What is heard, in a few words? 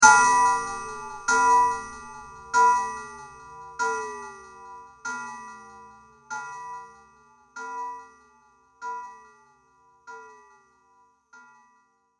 Haunted Village Bell; Ghost Sound Effects; horror sound effect